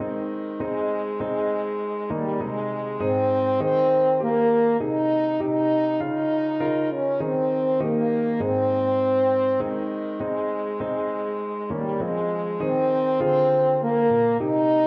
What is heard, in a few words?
french horn